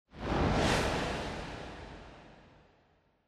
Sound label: Camera Motion Reverb; シーン・カメラモーション